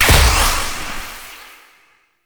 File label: Shock Activate; Warframe; Eximus